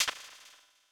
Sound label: Ta-Tap; Super Smash Bros